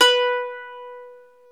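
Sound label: ethnic plucks, balalaika; Eth Plucks, Pluck Duo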